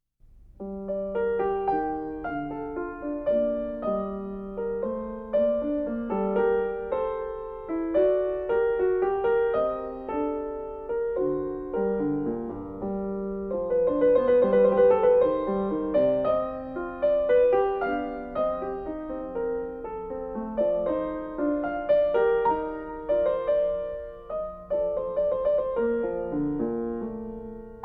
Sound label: Sinfonia No. 11 in G Minor